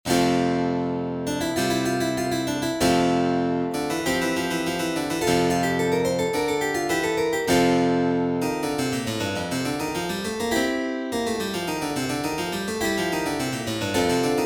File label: Virginale Praeludium